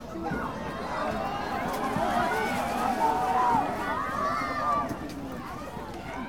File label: Crowd Cheer